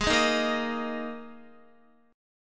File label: Ab Suspended 2nd Guitar; strummed; Absus2